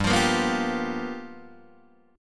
G 7th Sharp 9th G chord, Guitar Open D

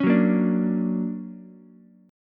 D Augmented Major 7th, down, Ukulele; D+M7 strummed; JGuitar

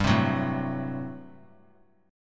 G Major 7th Suspended 2nd Suspended 4th; GM7sus2sus4 strummed; 19,19,21,19

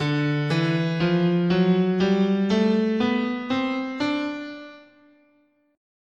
D Bebop Minor, guitar, scale

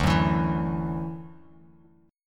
D-Major Add 9th D down Bass Standard; strummed; JGuitar

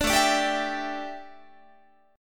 A 7th Suspended 4th, A, down, Guitar

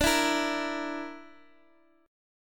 D Suspended 2nd Sharp 5th D, Guitar, Open D

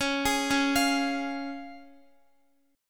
Db Major Db Guitar; arpeggiated

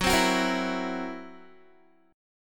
Fsharp 7th Flat 5th down Guitar; F#7b5 strummed

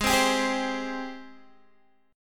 Ab Major 7th Ab down Guitar DADGAD

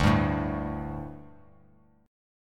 D Suspended 4th D; strummed

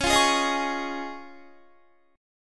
C sharp Major Add 9th C sharp down Guitar; C#add9 strummed; JGuitar

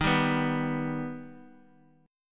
D sharp Augmented D sharp, chord; D#+ strummed; x,18,17,16; JGuitar

